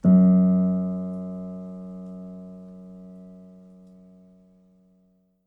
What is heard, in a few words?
piano sample